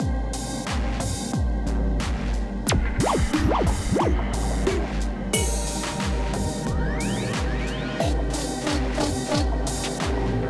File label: gameplay interstitial